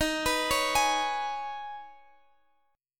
Eb 7th Suspended 4th Sharp 5th Eb, Guitar Standard; arpeggiated; chord